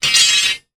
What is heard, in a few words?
impact metal rod hit ground hard; zapsplat